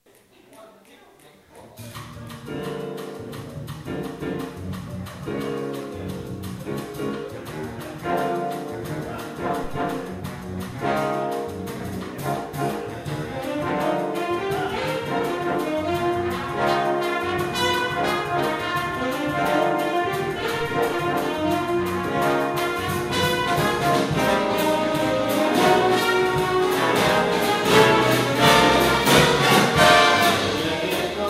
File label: Jazz Ensemble